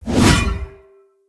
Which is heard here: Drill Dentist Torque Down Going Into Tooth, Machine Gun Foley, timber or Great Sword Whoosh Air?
Great Sword Whoosh Air